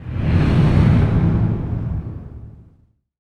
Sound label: IMP FLAME, Cinematic Impact